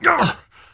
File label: pain sharp; garrosh